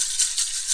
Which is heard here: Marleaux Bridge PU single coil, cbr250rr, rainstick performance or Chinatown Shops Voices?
rainstick performance